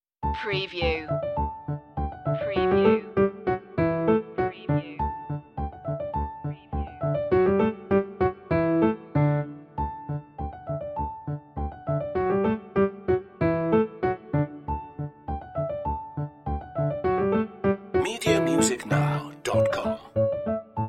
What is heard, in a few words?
bach musette d major; notebooks annamagdalena bach; bwv anh126